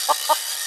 win Loop; eggomatic

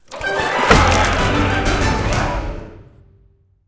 win mega win; goldentavern

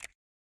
button Hover; silverback

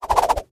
Boss Sign Spin; wild worlds

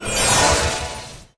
random symbol transform; fairyred

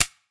reel Stop; dead or alive 2

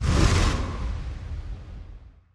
wilds moving; halloweenjack

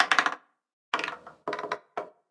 ball Bounce, roulette

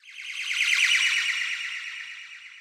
big Win Police 2 Oct Up; hotline2

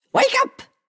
idle wakeup; goldentavern

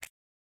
button Up; rome

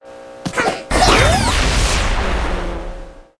win; space wars

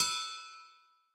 big Win Count Up End; wild turkey

